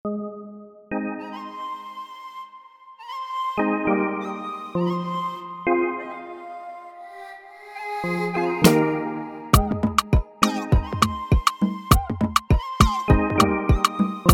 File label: Afrobeat instrumental